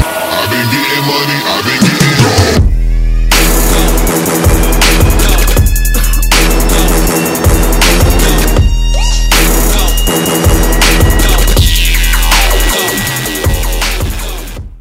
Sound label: electro; roundsound